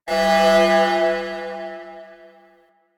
Orbiter Alarm Loop; Ambience; Warframe; The War Within

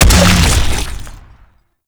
Jump Land; Enemies; Infested; Warframe; Femur

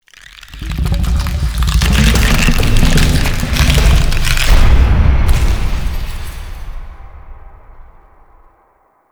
Angel Reveal SFX; Warframe; Zariman Quest

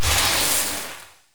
Shotgun Hit Fx Stinger; Weapons; Warframe; Pax Duviricus

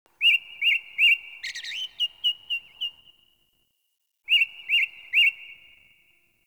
Tree Critters, Ambience Plains; Warframe; Eidolon Plains; One Offs

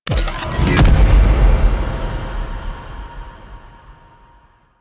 Eruption Cast; Warframe

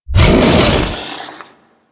Wolf Charge B; Warframe; Grineer

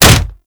Kick Hit Default; Melee

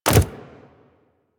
Land Default, Foley Footsteps, Pets; Warframe; Zanuka; Zanuka Companion A

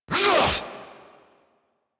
Melee Attack; New War; Erra

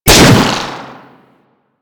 Raptor Fire 3D, Weapon Fire; Warframe

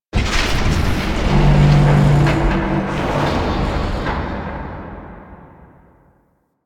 Void Dragon Move Long; Warframe; Duviri

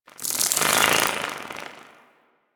Winged Insect Passby; Ambience; Warframe; Quests; The New War; Narmer Outpost

